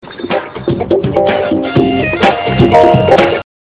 message music

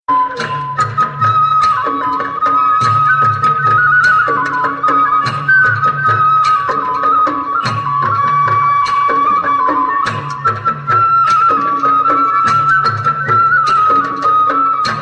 Inspirational

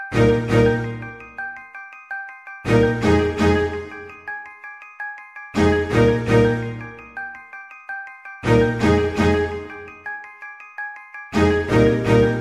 Sad Conclusion

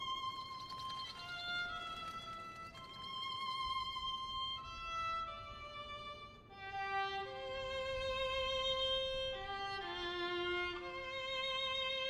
Violin Theme

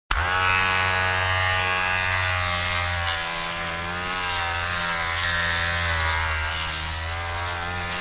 barber barber, ringtones children

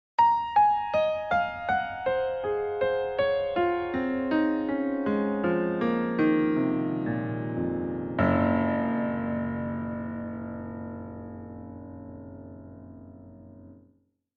Locrian Piano

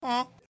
Honk; Jacksepticeye

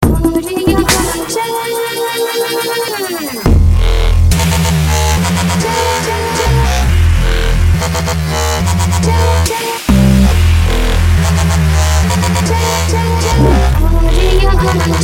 eastern jam

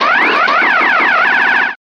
3d Pinball

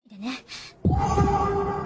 blood lust noise

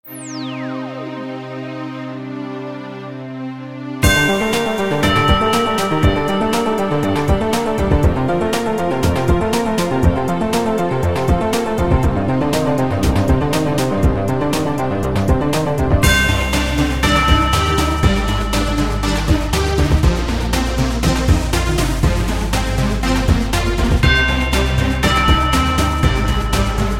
pop beat; ringtones

